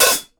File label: TIGHT HAT, HI-HATS; Sampleheads; New York City Drumworks VOL-1; KD HI-HATS